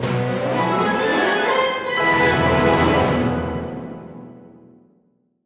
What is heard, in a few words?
Item Fanfare; owlboy score